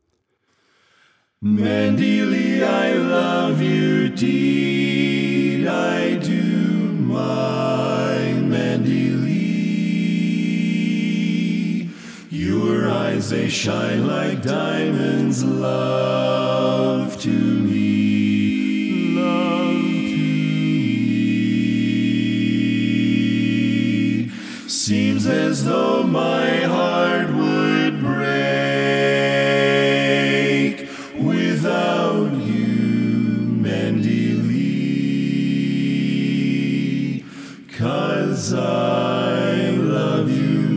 Bass, quartet

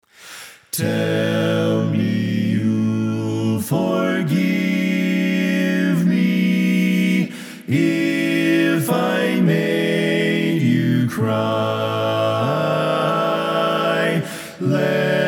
Bari, quartet

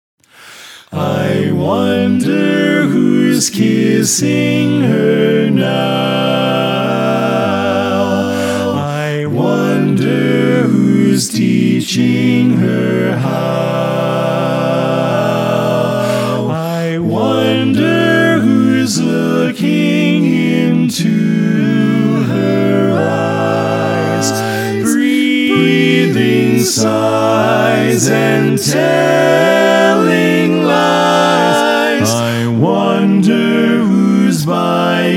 Equal Mix, quartet

